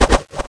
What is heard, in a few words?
knife spin; Machete; weapons